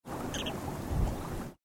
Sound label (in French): tournepierre a collier